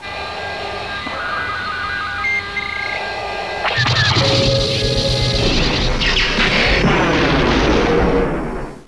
kill; starwars trench; darth

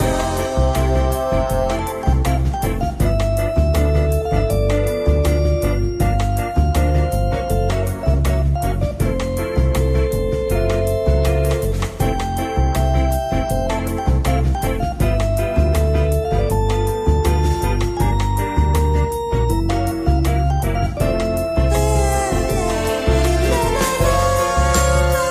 ambience song; awp xmas streets

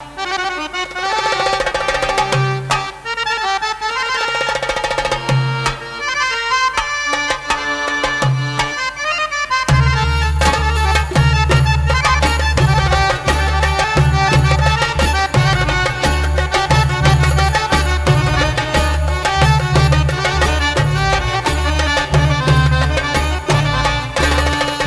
arab tabla

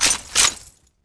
mg bolt, weapons; svencoop; ww2projekt

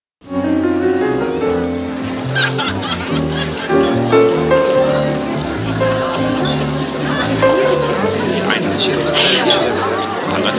hotel, ambience; paris

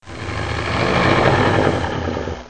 gonome idle; mystic radar; mr zombie1

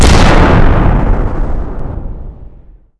explode; dm tarawa

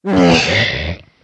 zombie attack; mystic radar; mr zombie1

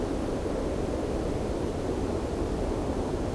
wind; de giza

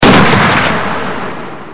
m2 shot, weapons

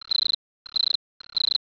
crickets; siege

